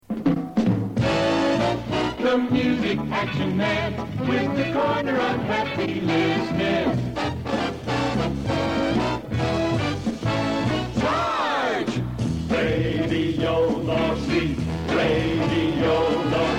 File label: jingles